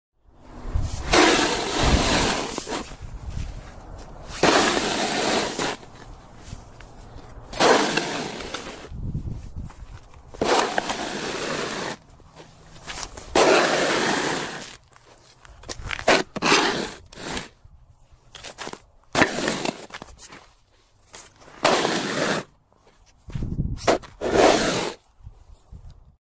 Shoveling Snow

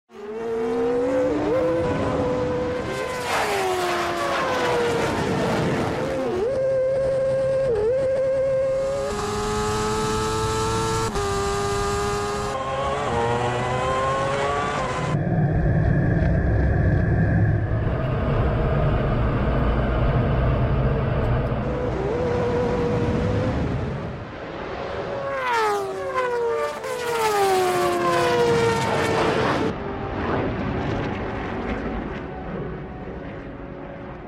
Formula 1 Car

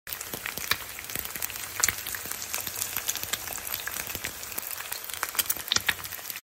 fried egg

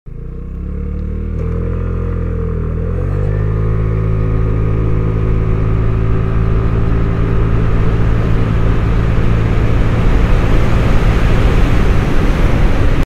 Vespa

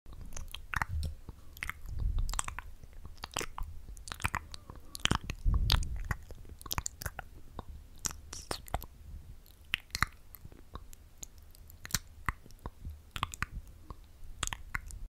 ASMR Mouth

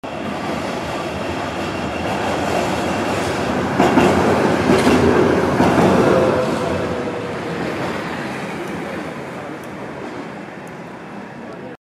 tram crossing

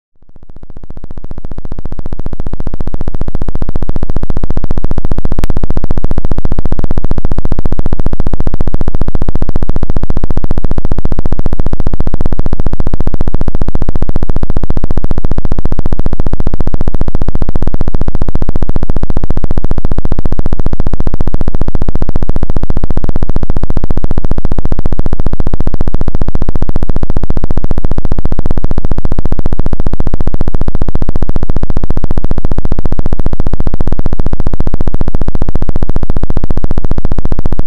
brain waves

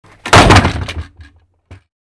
Wooden pallet

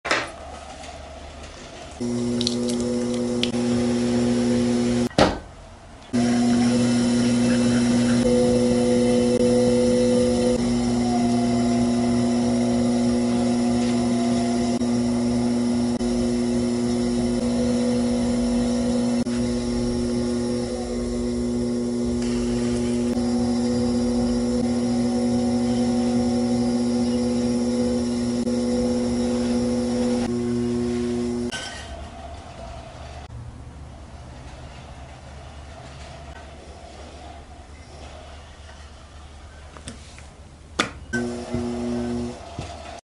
pottery

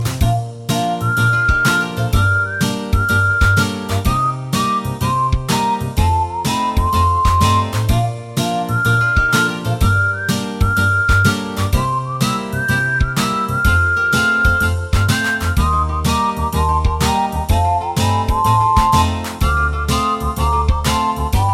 childish ringtone

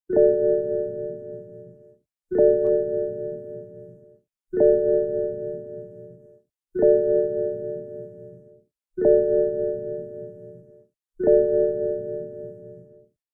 Seat belt horn

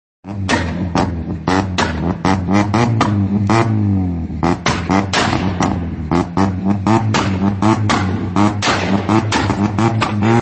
bullet exhaust

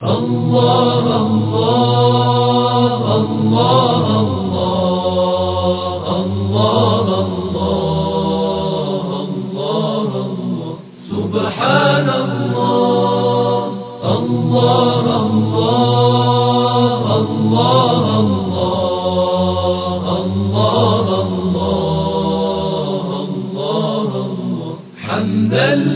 prayer ringtone

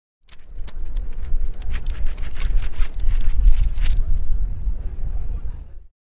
Shaking paper

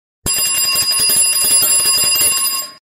Cycle Bell

